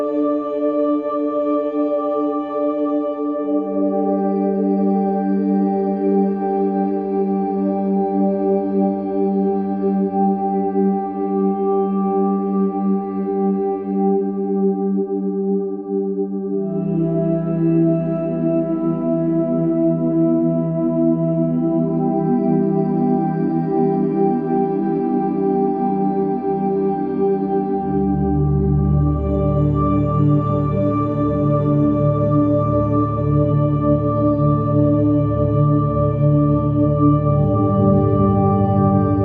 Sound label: Forest; Mindboost; MindMusik